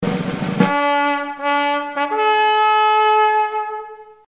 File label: Bugle Salute Sms